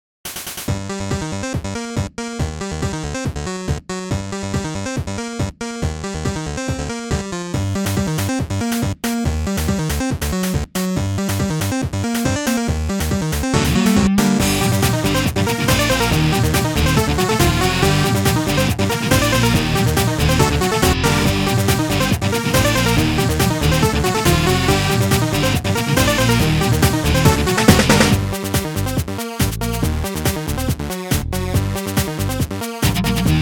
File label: battle, bgm; asciinevrdie; battle act4